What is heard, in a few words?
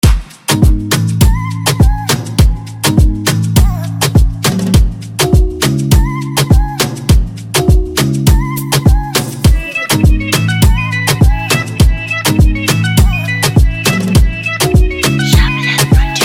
Instrumental